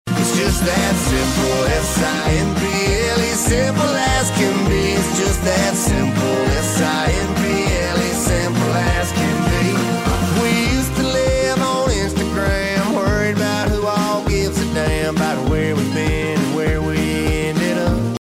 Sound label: Simple Ringtone